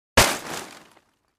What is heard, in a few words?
Foliage Lawn Bag with Branches Type 2 Dropped on Ground; hits & impacts